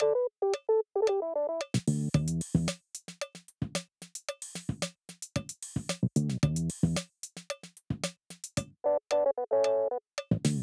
Break (Aircheck, Retro)

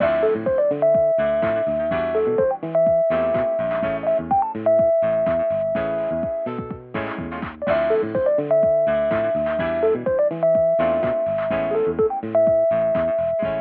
House, Aircheck, Phono

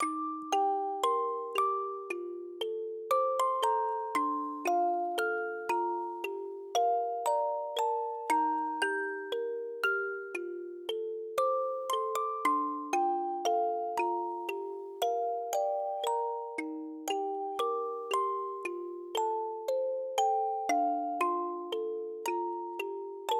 Robot Synth (Music Box)

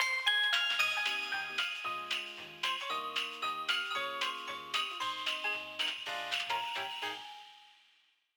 Radio Jingle Toy Day

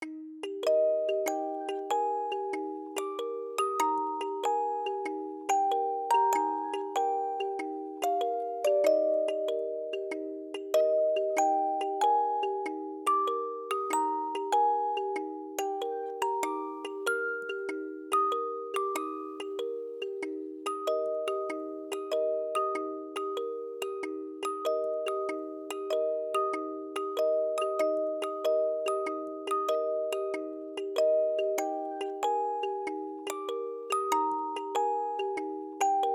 Faire, Music Box

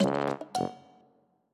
Island Tour Award Ceremony Ends

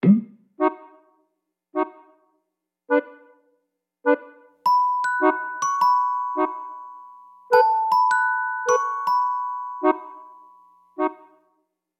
Rainy

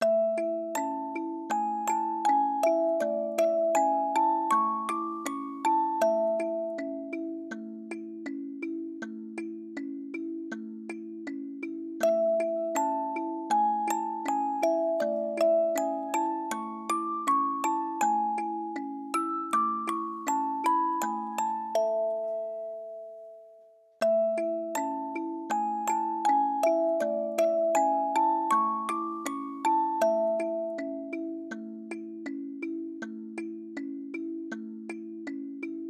Music Box

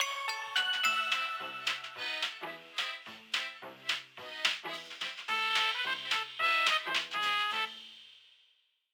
Radio Jingle; Halloween

